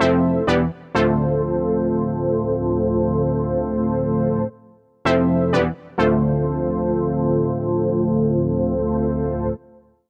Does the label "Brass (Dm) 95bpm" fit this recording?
Yes